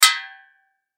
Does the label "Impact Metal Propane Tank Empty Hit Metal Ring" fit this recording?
Yes